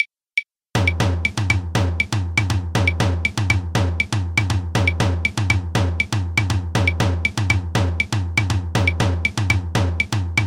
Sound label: mozambique bombo; Le Mozambique est une musique de carnaval; Le Mozambique est une musique de carnaval créé en 1963 par Pedro 'Pello el Afrokán'